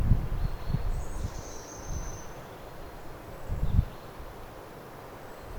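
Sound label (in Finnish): ilmeisesti punarinta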